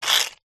foley money australian 5 cent coin slide short on tiled table; zapsplat